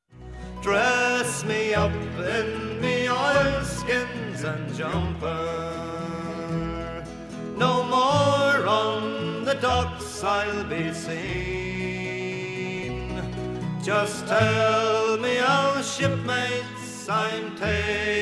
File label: folk group